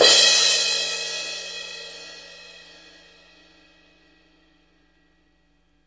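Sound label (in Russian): тарелка для креша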